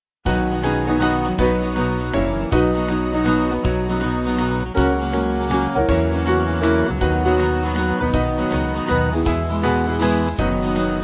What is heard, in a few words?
Band, Music Backing Files for Churches; Jesus May Come; Marsh